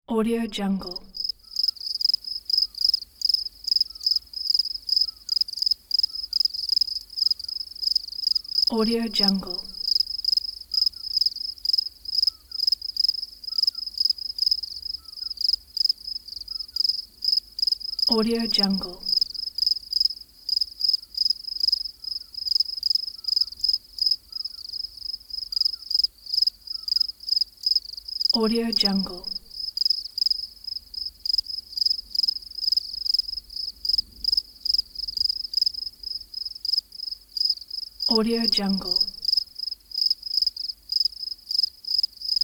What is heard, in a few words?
night crickets